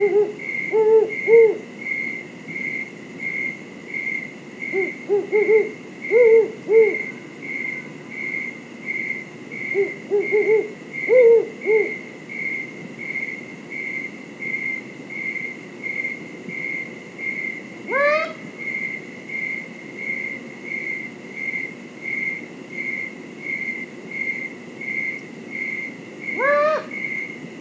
Great Horned Owl

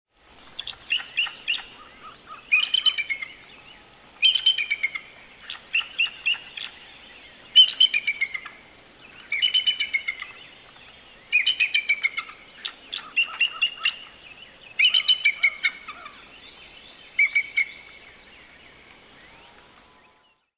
Bald Eagle

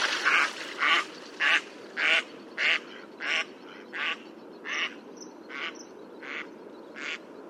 Mallard

